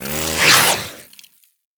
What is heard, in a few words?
Kusarigama Plasma Whoosh; Warframe; Grineer